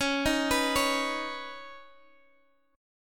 C sharp 7th Suspended 2nd, C sharp, down, Guitar, Drop D